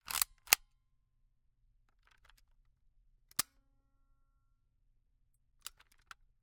Camera, Kodak Extramax, pocket, 110 film, plastic, metal, advance film, snapshot, handling; Eiravaein Works; Vaeyan IV